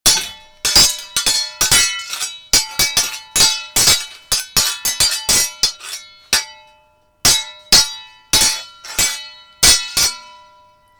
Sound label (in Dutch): Zwaardvechten